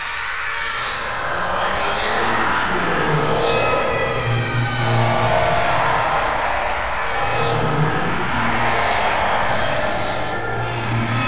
tortured souls loop

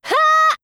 hhaaaaa

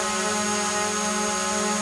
drone wings

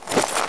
tripmine draw

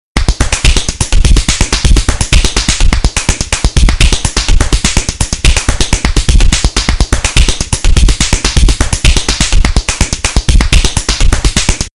slap

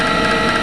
hum, comp

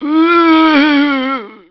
zombie attack, male

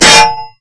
axe hit

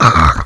pain

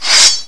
sword pull out

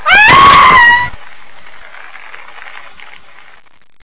hanger scream

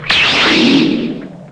recover jump

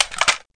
m203 open, weapons

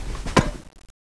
idle, weapons

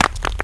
brick bounce

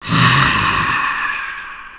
scream; vegeta

